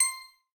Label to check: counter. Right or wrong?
Right